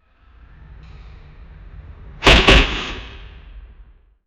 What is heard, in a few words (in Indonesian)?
suara gemuruh dan langkah; sofa